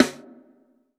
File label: snare, snare house